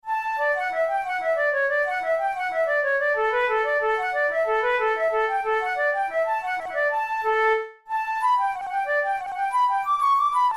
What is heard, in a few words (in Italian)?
capricci per flauto traverso; ruge